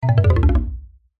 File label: cartoon descend fall mallet